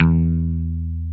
DAN MUTE E3, Bass

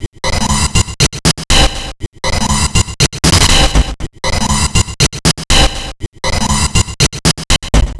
Envelope Beat; Drums & Drones

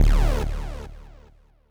Synth Hit D; Zero-G Trance Inducer; 90 s Sample CDs

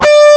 EG DIST BD, FUNK

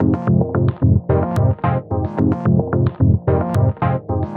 110 Fm, Melodic Loops; M8; Electronisounds

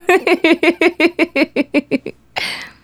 Female Laugh; Laughs; Sonic Foundry (Sony Creative Software); Vocal Toolbox

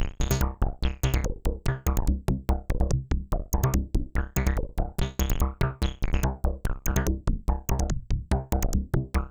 C2, Synth; 90 s Sample CDs; NiceBeats; SynthLine VOL-21

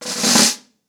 Snare Cres, drums singles; The Roots Drumloops

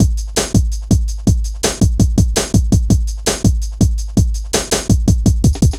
Ezee Rolla, Drum Loops; Zero-G Jungle Warfare 1, 2 & 3

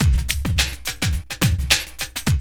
LOOP; X-Static Goldmine 2; IMIX LOOP

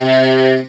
TB SYNTH EH, Talk Box

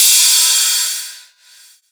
Hat, Drum & Bass

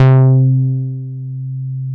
MOOG1 BASS C3

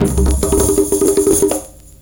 PERCS, 70 s Breakbeats

